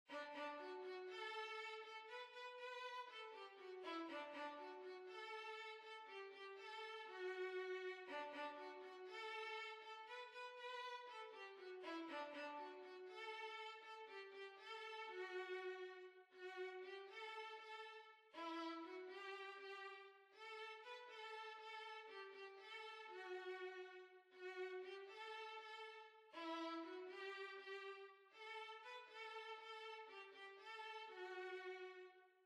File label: Violin